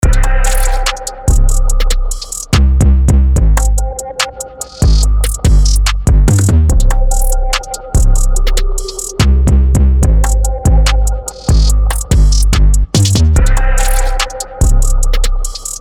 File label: Trap N Drill Rythms